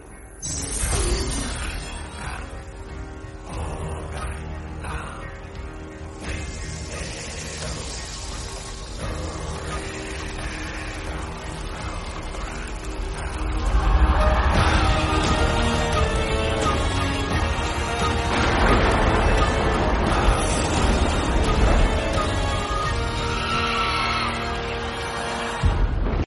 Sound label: entry bgm; adipurush; ravan